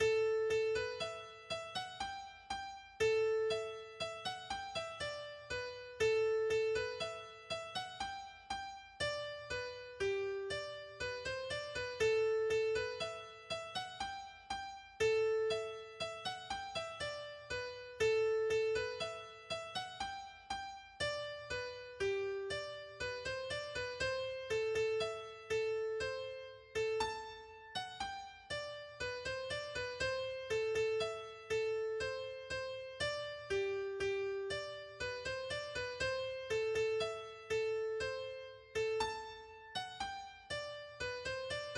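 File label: Hornpipe; Hartshead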